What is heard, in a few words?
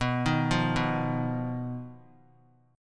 B Major Flat 5th B, chord; BMb5; arpeggiated